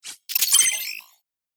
Stereo Technology, notifications; Lenovo; ZUI 13

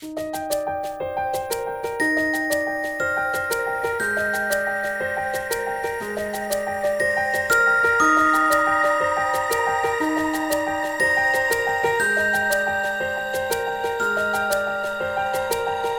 Silence, ringtones; Lenovo; ZUI 13

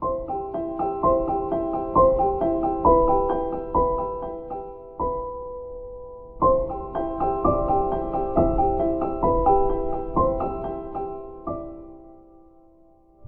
Soft Ripples, ringtones; Samsung; Galaxy A56; Calm

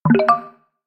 Slide, notifications; Coolpad; Note-8

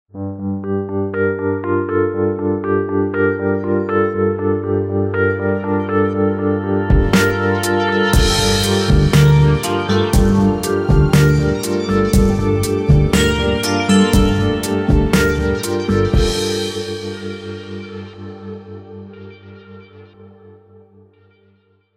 Floating; Nokia; Nokia Tones; Windows Phone apps

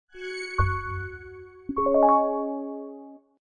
boot audio; Highscreen Power Rage

